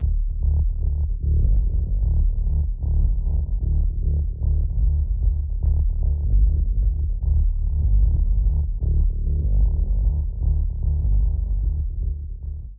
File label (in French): synthe sawbass